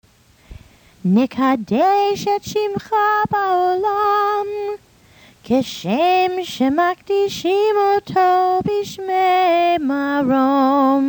Nekadesh chanted Hebrew; bar or bat mitzvah

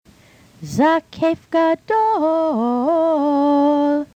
Haftarah Zakef Gadol

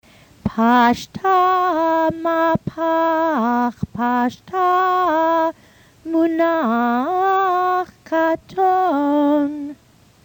Haftarah Pashta Mapaach Pasgta Munach Katon

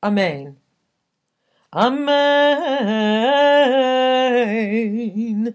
Eicha amen

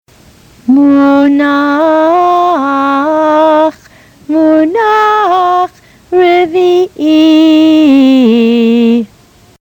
Torah Munach Munach Rivi'ee; barbatmitzvah

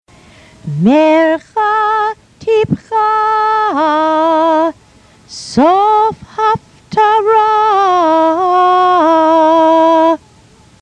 Haftarah Mercha Tipchah Sof Hahaftarah

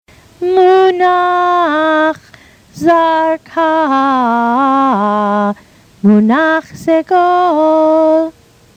Torah Munach Zarkah Munach Segol

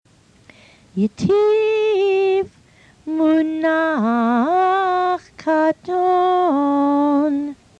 Haftarah Ytiv Munach Katon

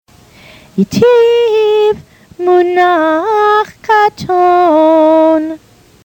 Torah Ytiv Munach Katon

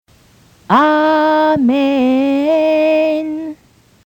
Torah Amen

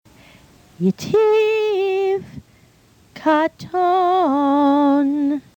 Haftarah Ytiv Katon

